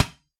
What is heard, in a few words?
toy plastic ball single bounce; sound effects one